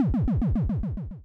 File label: death